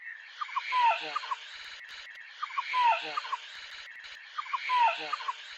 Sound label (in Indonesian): burung kadalan birah